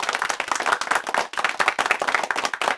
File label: Small Crowd Clap; David Beckham Soccer; XBOX